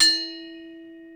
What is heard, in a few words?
BELL E2, TIBETAN BELLS; Crypton - DrumBase5000